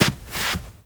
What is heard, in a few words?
Rug Turn